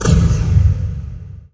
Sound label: weapon fire